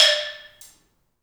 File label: BALAMBO, BALAFON; Heart of Africa